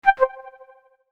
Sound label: Neon, ringtones; Explay Fresh; notifications